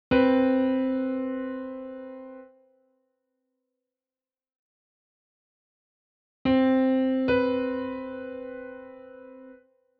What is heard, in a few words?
interval